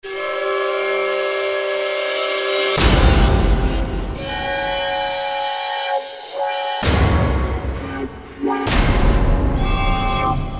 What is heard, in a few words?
Final Sting, Roland SR-JV80-15 Special FX; Roland SR-JV80-15 Special FX Collection